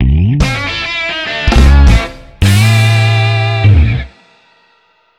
Side Case End Funny; Lost Judgment

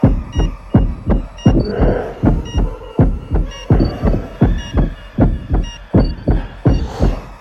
UI Low Health Loop Stereo; Infinitum

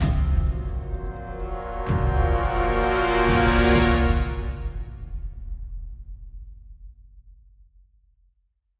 Combat Lite; Susendtag

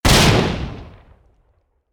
Crossbow Explosion; Special Crossbow Explosion; looterkings

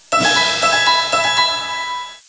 Winning; ABC World Reference Wide World of Animals

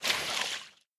deep water footstep; Adam's Venture Origins

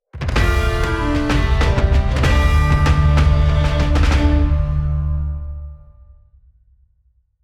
level up; Blackwake

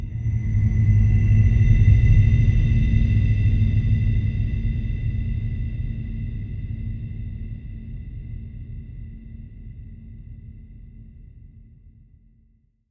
System Drones - Dronetail; 3030 Deathwar Redux A Space Odyssey

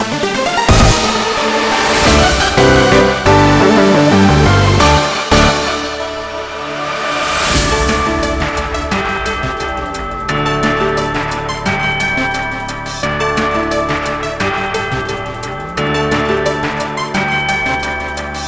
Victory!; BB League Elite Four; The Hidden Treasure of Area Zero Part 2 The Indigo Disk

